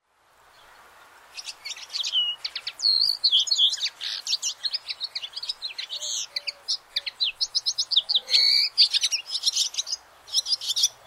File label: barn swallow; Beesonend Lane, Redbourn, Hertfordshire